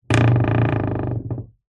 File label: household door creak wooden dull